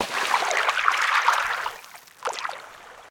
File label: idle water; smite; god merlin